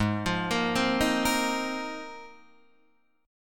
G sharp 6th Add 9th, Guitar, Open D

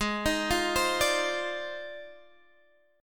F Minor 13th, guitar chord

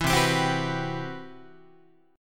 C Major Sharp 11th, Guitar; CM#11 strummed; JGuitar

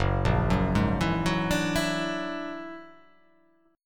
F sharp Augmented 9th

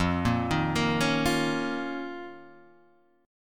D sharp 7th Suspended 2nd

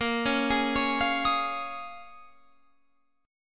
A sharp Minor 11th, Guitar, Open F; arpeggiated

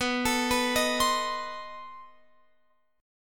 B 7th Flat 9th B Guitar Open F; B7b9; arpeggiated

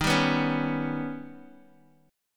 D sharp Augmented 7th D sharp Guitar Open D

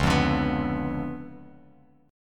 C sharp 7th Flat 9th Bass Standard, chord; C#7b9; strummed; JGuitar

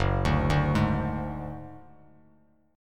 F sharp Suspended 2nd Sharp 5th, Bass Standard; arpeggiated; JGuitar

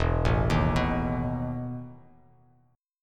E Major 7th Suspended 4th E down Bass Standard; EM7sus4 arpeggiated

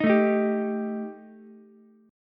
G Suspended 2nd Sharp 5th G Ukulele; Gsus2#5 strummed; JGuitar

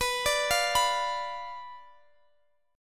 B Diminished B down Guitar Drop D

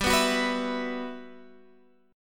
B Suspended 4th, G, chord; strummed; Bsus4; JGuitar

